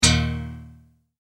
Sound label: Multimedia Tone-Stop